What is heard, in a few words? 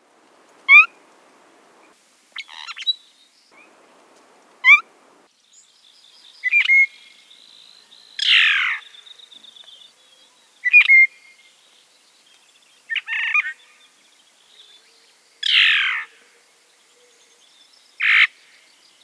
Phainopepla